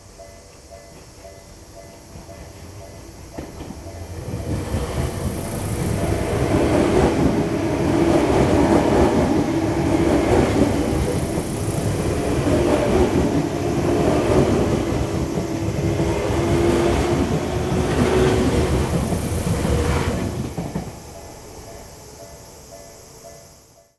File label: train